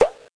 Pop Cancel; Learn to Fly 3

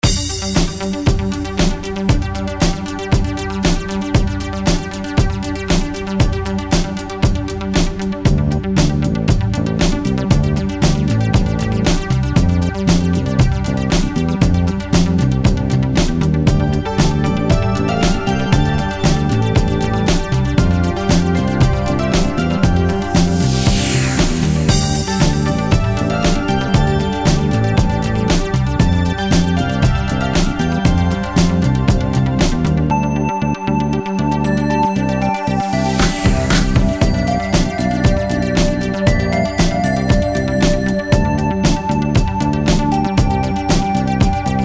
Menu Music; Industry Giant II; Windows

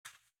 Land Dirt and Grass

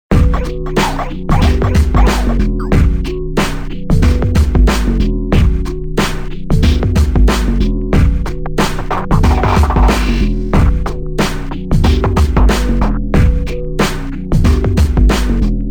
Music Bar

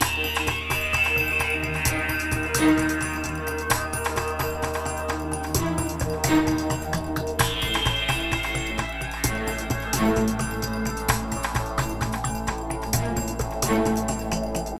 Stealth Loop

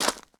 Foot Gravel Shoes Walk; Lucius III

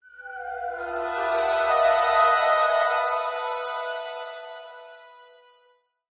Stinger Light; Angry Birds Star Wars 2; Crimson

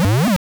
Milk Drink Loop; Pokemon; Gen 2; attack moves; GSC

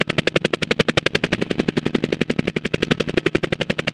Helicopter Rotor; Bad Piggies

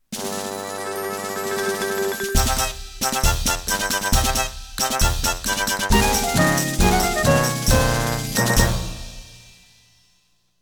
world clear jingle

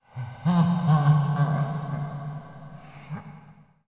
laugh; fredbear

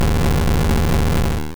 Fire Blast; sfx; attack moves; pokemon; gen 1; rby

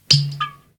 Drink; the 39 steps

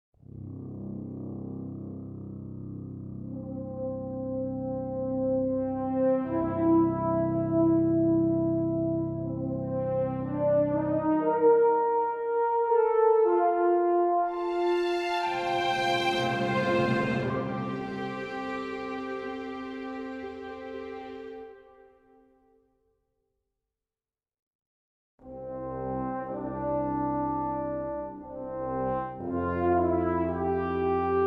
World Music

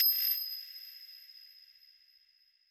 Music; Wug Music Hideout3

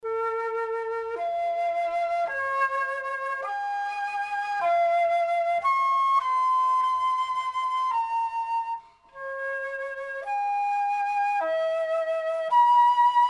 Flute; Quest for Glory 5

